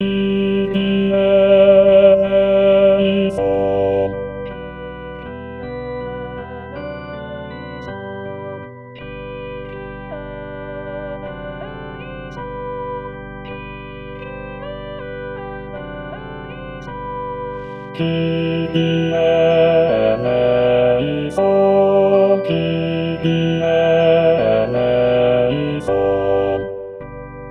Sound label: bass kyrie